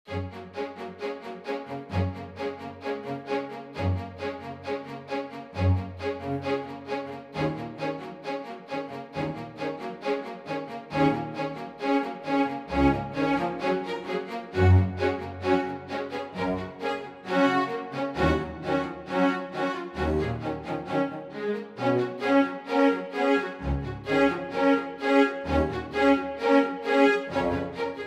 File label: Chamber Strings